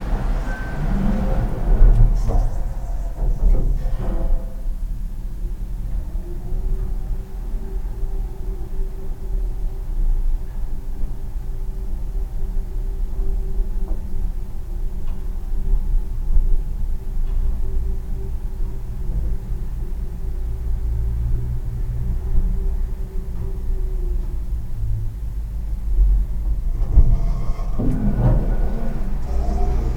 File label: elevator down